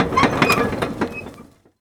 wheel turn

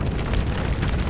burn

